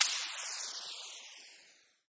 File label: Balloon deflating